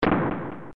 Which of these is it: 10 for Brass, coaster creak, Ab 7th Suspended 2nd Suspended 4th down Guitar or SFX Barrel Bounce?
SFX Barrel Bounce